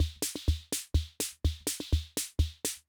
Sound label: Loop, rhythm king; classic machines